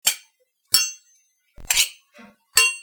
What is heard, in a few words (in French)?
Epée; bruitage; Armes